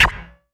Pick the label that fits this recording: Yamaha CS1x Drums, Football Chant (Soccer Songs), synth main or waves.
Yamaha CS1x Drums